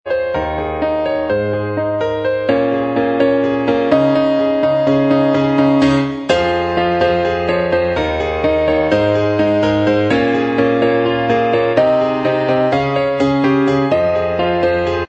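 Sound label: acoustic instrumental Track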